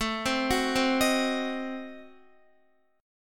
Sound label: A Diminished 7th A down Guitar